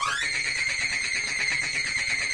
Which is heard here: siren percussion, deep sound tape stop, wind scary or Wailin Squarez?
siren percussion